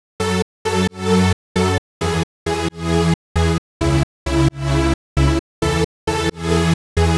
133 House Pulsed Synth F#maj; Dance DJ; Pulsing; Digidesign; Pro Tools Essential Loops

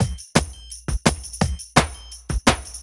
Dance Beat

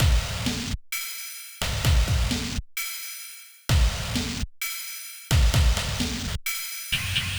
Pop Fx Drums, Slow Tempo; 065; Pop Rock; Digidesign; Pro Tools; Pro Tools Essential Loops

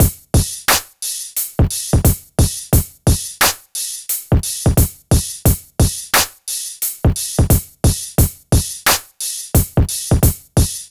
E Coast HipHop Drums

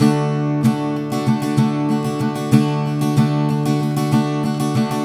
Pop Ac-Guitar Strum Dmaj; 096; Pop Rock; Acoustic Guitar; Pro Tools Essential Loops; Digidesign

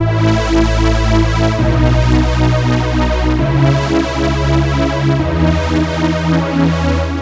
133 House Straight Pad C#Maj; Dance DJ; Synth; Pro Tools Essential Loops; Digidesign

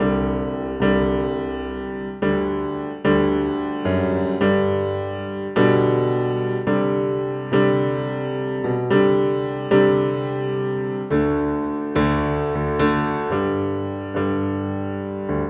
Country Piano, Chorus 3; Digidesign; Pro Tools Essential Loops

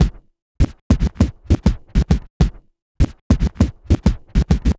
Vinyl Scratch Kick

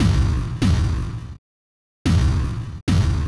146 Techno Bomb Kick; Dance DJ; Drums; Pro Tools Essential Loops; Digidesign

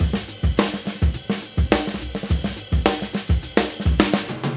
NuJazz Drums, Jazz; Digidesign; Pro Tools Essential Demos; Essential Loop Demos; Demo Session - Jazz

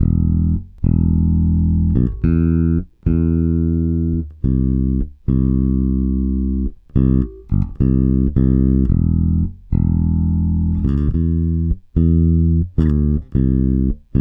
Country Bass Verse 2 Alt; Verse 2 Alt 1; Digidesign; Pro Tools Essential Loops

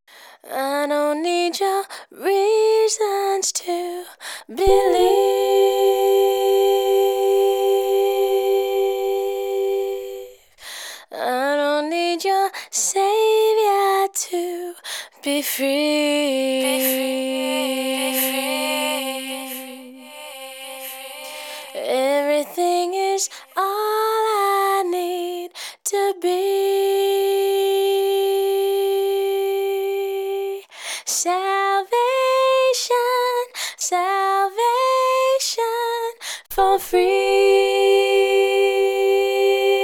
Vox; Pro Tools Essential Demos

